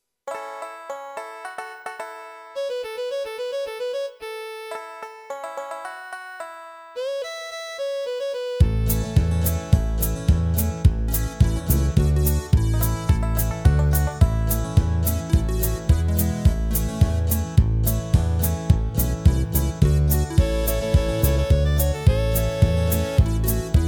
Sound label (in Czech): banjo ma pet strun